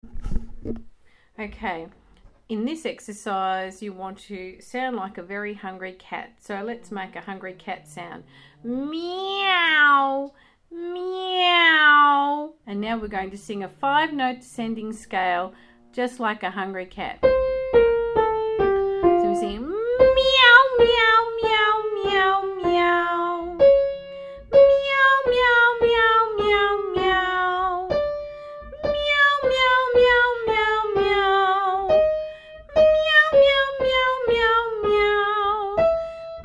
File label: cat meow descending scale; singing exercises